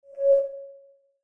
menu; The Fapocalypse v0.3.3d

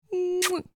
girl kiss; Inseminator

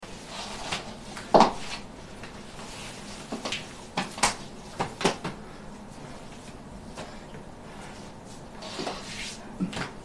dress, house

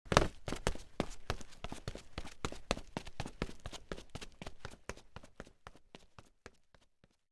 run; A succubus is born

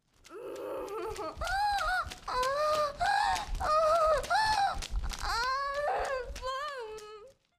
whip

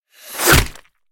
precise shot, combat ranger; A succubus is born

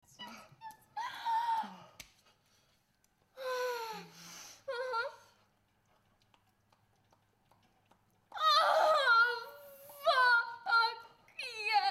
moan

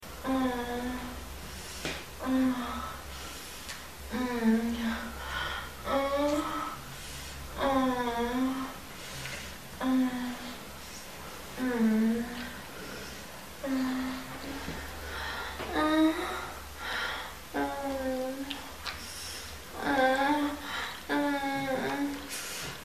moan

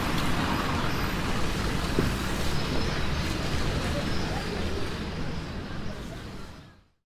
city

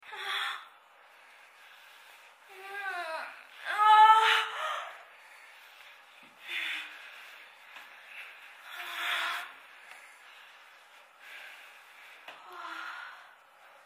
moan

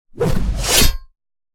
sword strike, warrior; A succubus is born; combat

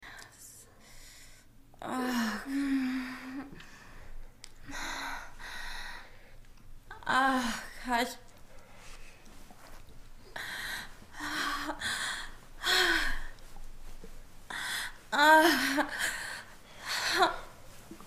moan

